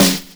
Snare

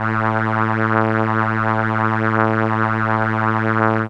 Horn A2, ARP Solina; musicradar-synth-samples

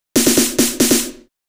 Fill Snares, 140 BPM; Pitched; Vengeance Samples; Vocal-Hook Kits; Song Kit; Break The Walls

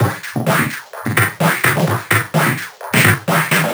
VFX2 Loop 128 BPM

